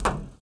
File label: roof step; Golden Crayon Sounds